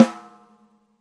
Premiere Steel Hard, Drums And Percussion; Pro Sounds Sample Collection

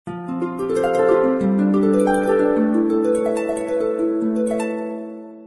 beat level; Geschicklichkeit; Cupids Match Up

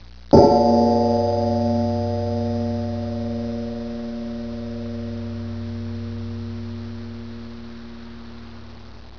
BIG BELL; Talking E-Mail

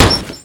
punch

